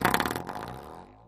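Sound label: Spring Door Stop Boing, door stopper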